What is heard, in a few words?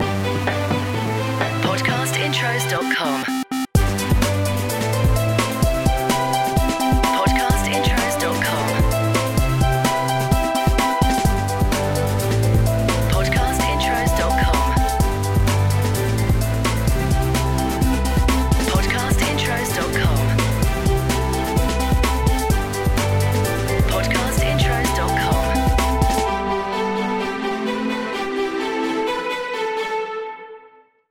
BREAKBEAT; Podcast Watermark